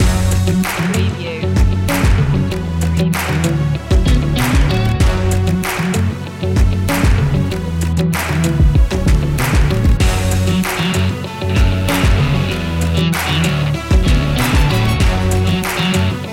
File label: full throttle loop